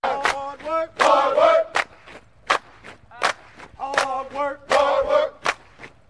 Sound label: Hard work cadence